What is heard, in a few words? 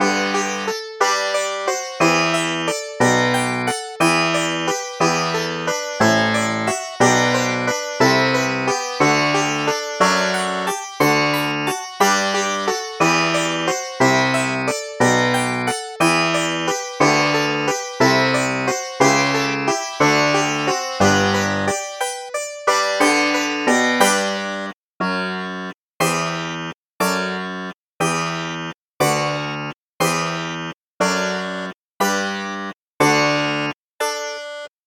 sitar